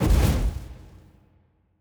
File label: kaiju superweapon fire burst; Stellaris; biogenesis; ships